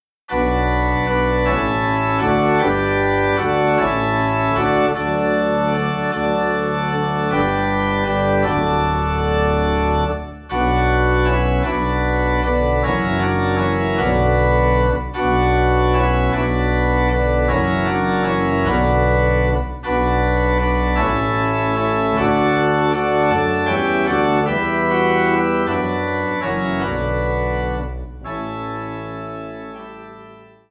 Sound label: Organ Low in B Minor; christmas; We Three Kings